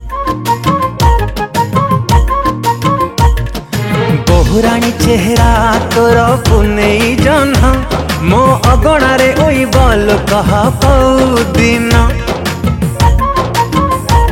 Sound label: Romantic Song